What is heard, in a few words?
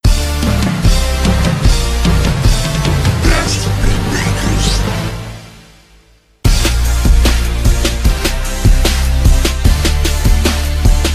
ringtone